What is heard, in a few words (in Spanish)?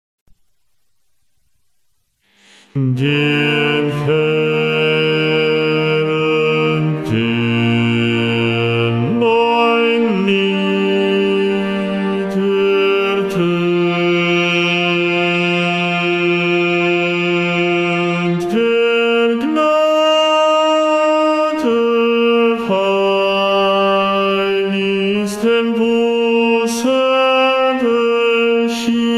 Bajo II VOZ Coro Peregrinos; opera